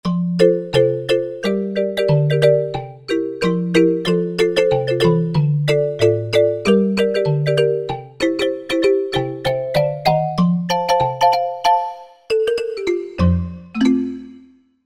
African Kalimba, Korg Wavestation

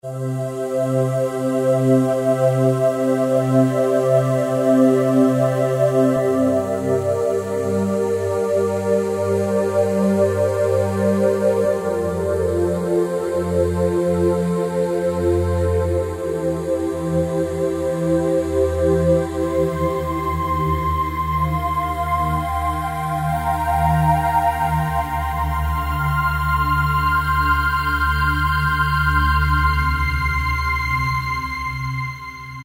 Vox Pad, Roland JD-990; Factory Patches; Lovely Vox Pad

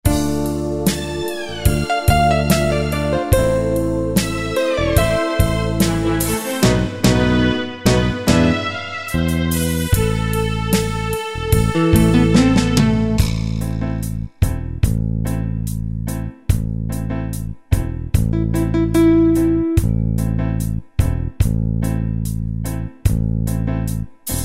Enka, Yamaha QY70 Preset Styles

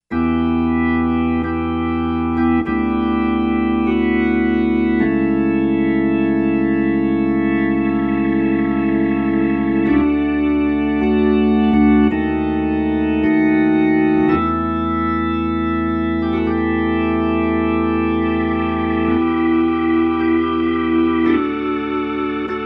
THE E.ORGAN, Roland SC-880; Factory Patches

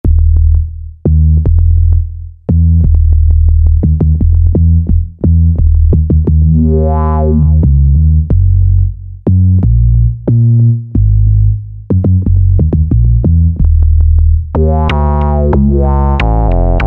Korg microKORG Dark Bass; Factory Voices

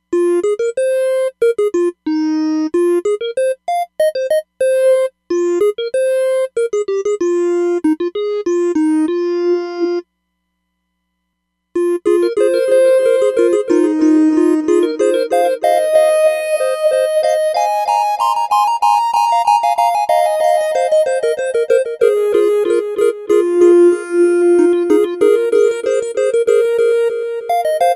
Echo; Roland DEP-5; Factory Patches

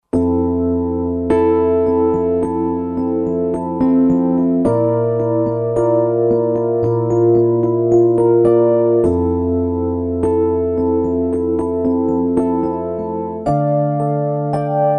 RD Rhodes; Piano; Roland SR-JV80-03 Piano

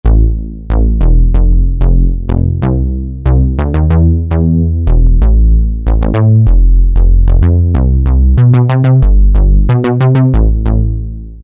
Bass, Alesis Micron; Factory Programs

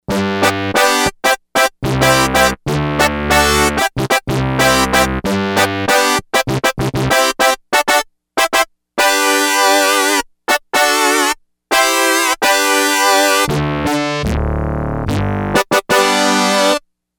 Power Brass, Yamaha DX100; Factory Patches

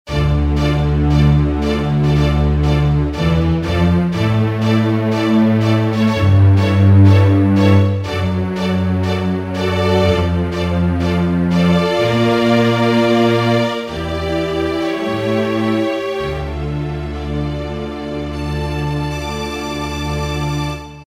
Casio CTK-601, Tones, Strings

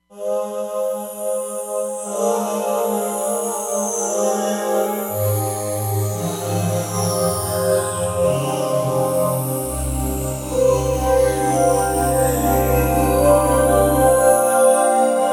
Choral Sweep, Roland Fantom XR

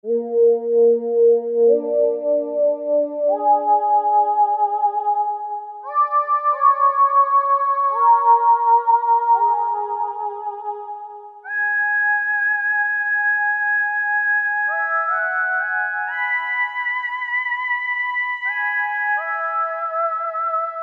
VOX HUMANA; Akai SG01v

